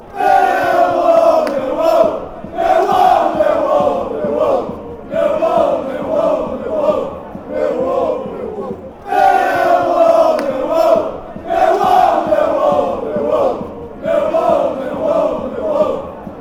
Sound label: chants; millwall millwall wall